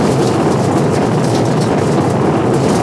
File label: wind rush; AOS RF1 Kart Demo